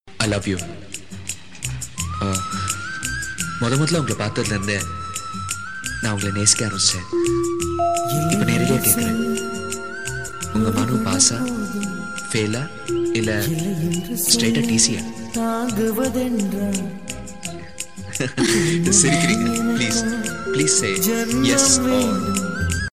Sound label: emotional love